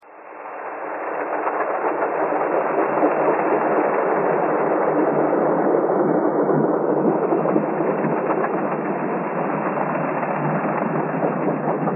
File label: train thread all